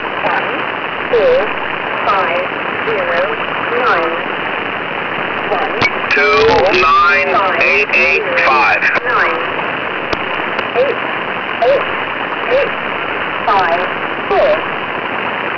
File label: QRM